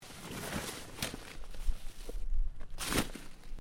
Body Movement Snow Lift

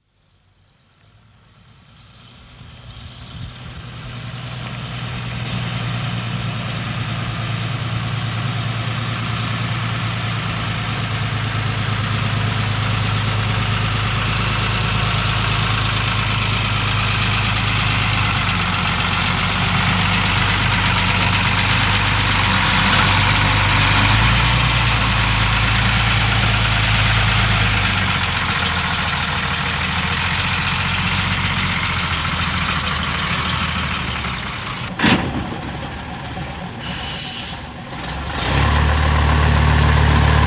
Exterior, approach and stop, 2 ton diesel truck; Exterior,slow approach and stop, gear change, depart (2 ton diesel truck, make not known); BBC

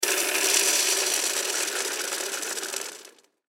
Deer Feeder Pour In; Pouring Sound Effects; Objects; Foley

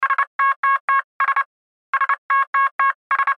Radio Morse Code; Morse Code Emergency Sos Twice; Morse Code Sound Effects; Blastwave; Warfare

